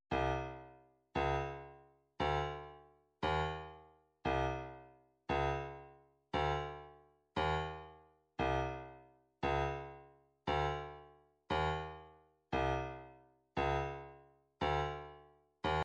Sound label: snare